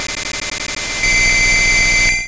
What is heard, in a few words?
Supersonic